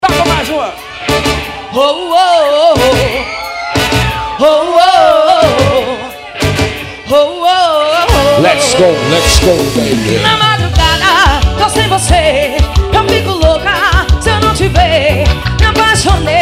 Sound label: kizomba ao vivo